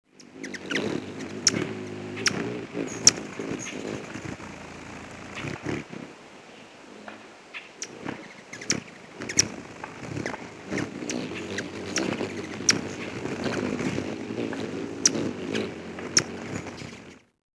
magnificent hummingbird

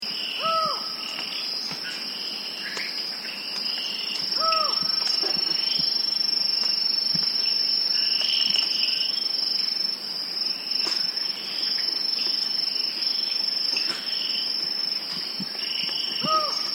collared forest falcon

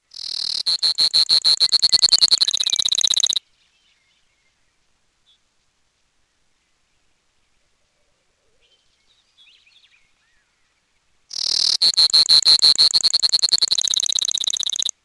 brewers sparrow

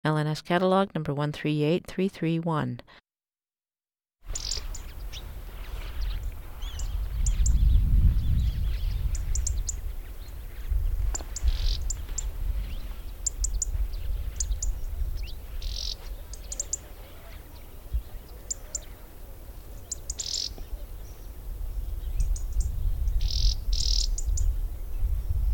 white throated robin

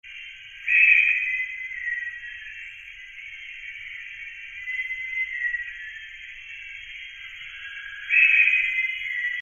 bornean peacock pheasant

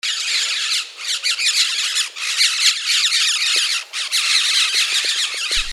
red eared parakeet

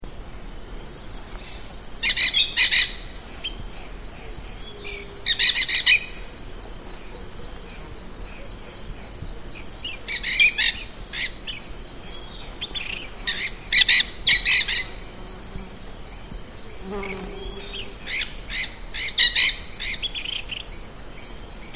dusky headed parakeet

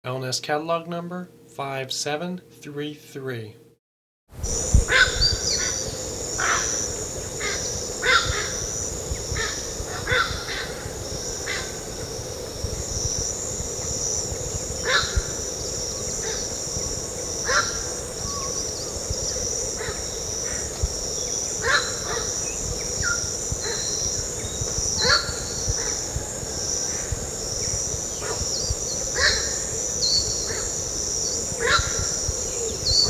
pacific reef heron

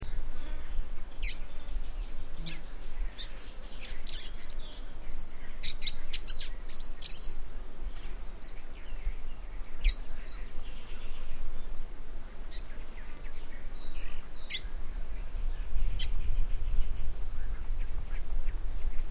parrot billed seedeater

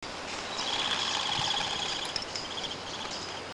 bare faced ground dove

